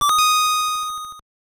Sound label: Got Bell